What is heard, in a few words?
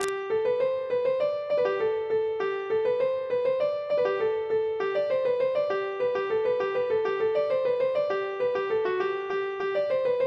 laride gavotte; bzh; boeuf trad